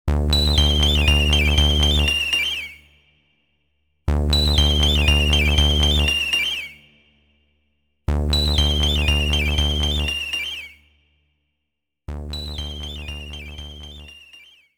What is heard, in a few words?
Radio Transmission